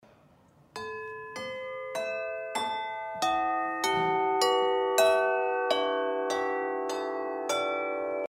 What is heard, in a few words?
Harmony Bells Minor Bunch; Orange Yellow Indigo